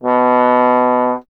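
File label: bass trombone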